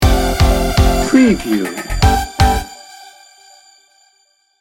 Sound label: technology pulse sting bumper